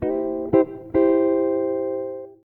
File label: achievement earned; Swapperoo; Android iOS mobile Switch Windows